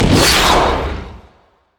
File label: leap; rengar